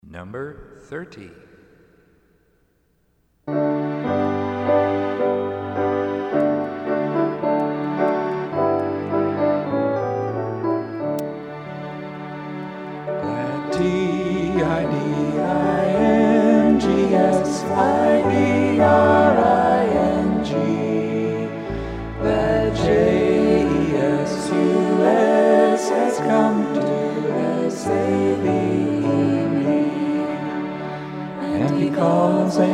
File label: Hymn